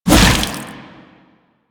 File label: Shield Lancer Shield Swing; Warframe; Lasrian; Lasrian Soldiers; Weapons